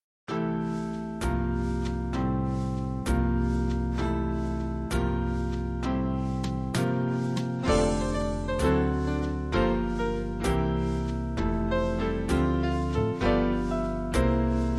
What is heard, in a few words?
swing; emdr